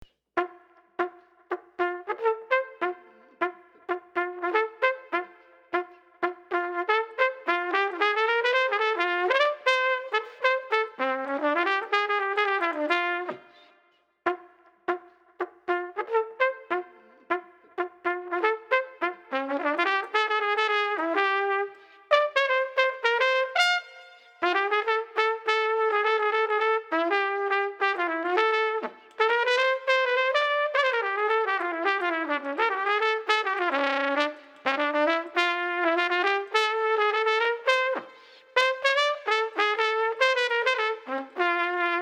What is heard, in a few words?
Street Trumpet Funky Bop